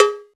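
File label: Linn 9000 cowbell; Akai MPC-5000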